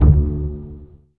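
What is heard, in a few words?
bass pizzicato D2, Virtuoso Strings; ILIO; Kirk Hunter Virtuoso Strings VOL-3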